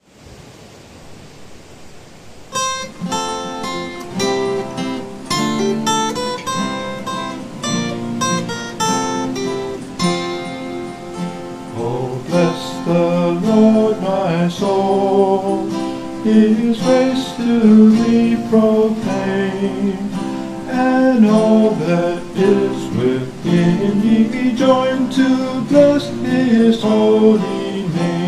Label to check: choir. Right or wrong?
Right